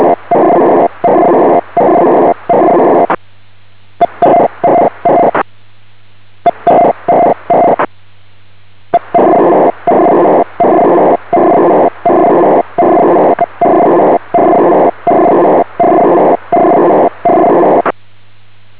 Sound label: 39.5500 Mhz